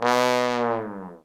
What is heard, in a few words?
TROM LNG FALL